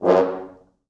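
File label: 4 French Horns, Horns; F#1; East West; Quantum Leap Horns Sax; 4 FrHorns MOD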